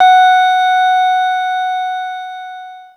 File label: WINE GLS, Synclavier Sampler; Synclavier Sampler Library; KEYS AND GUITARS